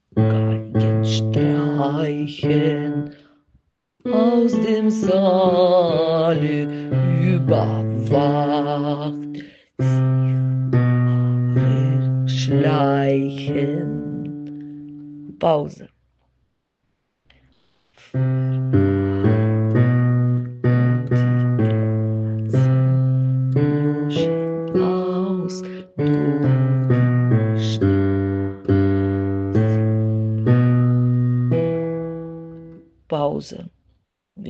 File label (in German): Bass; Uhren schlagen; ab Takt 10